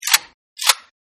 Gun Clip Insert, Generic Gun Ammo Clip Insertion Sound; Gun Handling Sound Effects; Guns